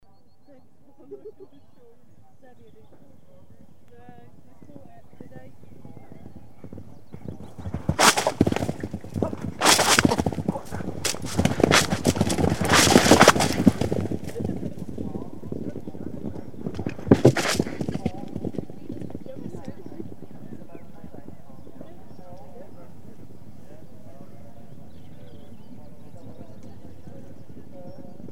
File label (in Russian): Skachki